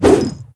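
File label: Attack Two Hand Axe; Seer; GamigoNA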